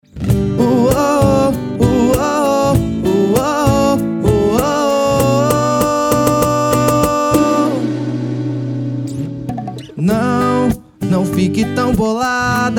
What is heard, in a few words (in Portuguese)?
sertanejo sofrencia